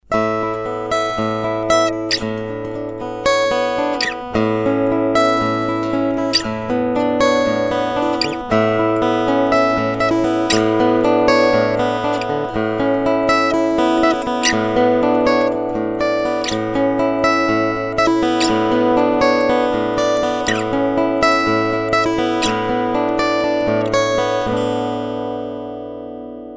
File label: sweet picking